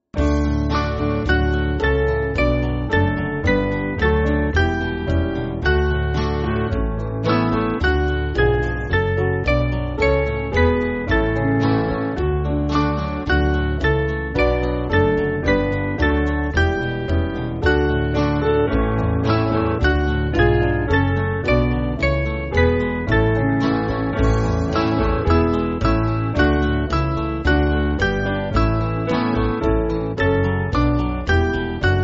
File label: Band, church music